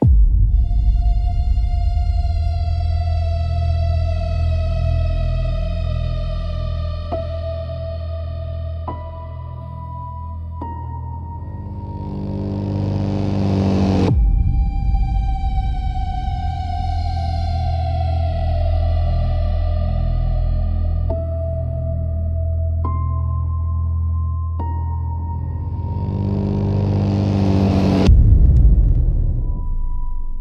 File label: aggressive panic for direct fear